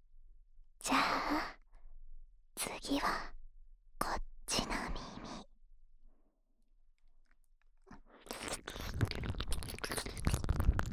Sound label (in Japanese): 耳舐めと手コキ（左耳）